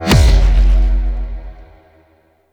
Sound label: Railjack Thumper Explosion Initial; Warframe